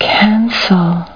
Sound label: CANCEL